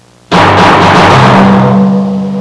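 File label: single gong; Music; Sound Library 2000; Wayzata Technology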